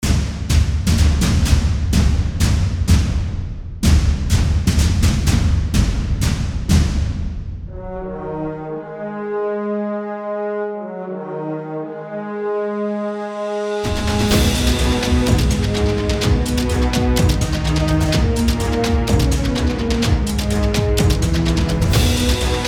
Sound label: Instrumental